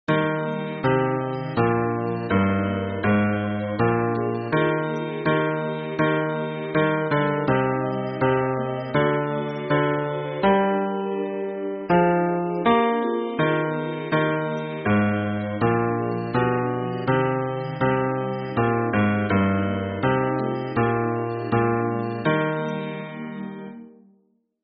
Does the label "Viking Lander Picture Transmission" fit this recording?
No